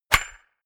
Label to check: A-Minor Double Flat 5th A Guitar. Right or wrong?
Wrong